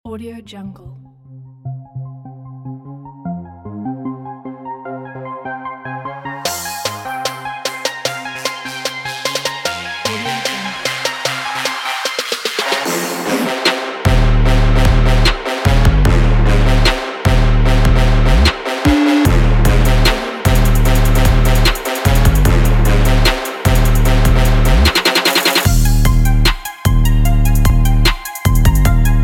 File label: energy trap